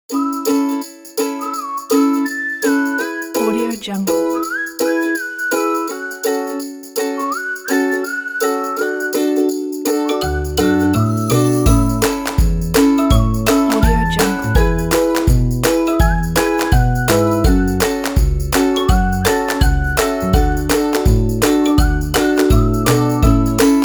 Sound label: cute